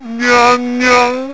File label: alert; votemap; overflow